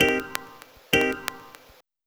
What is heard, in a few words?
FK KEY, FUNK; USB Soundscan vol.47; AKAI